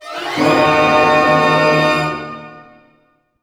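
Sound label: glissando A minor, orchestra; Sound On Sound - Peter Siedleczek's Orchestra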